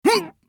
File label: scav wound; eft